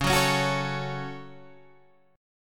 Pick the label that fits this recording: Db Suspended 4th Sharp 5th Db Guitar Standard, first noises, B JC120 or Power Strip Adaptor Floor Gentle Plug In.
Db Suspended 4th Sharp 5th Db Guitar Standard